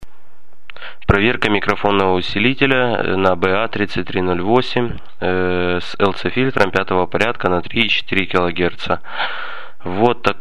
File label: 3-4 khz